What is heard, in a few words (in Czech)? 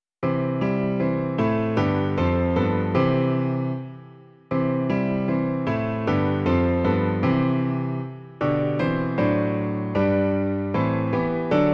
klavír; Klavírní verze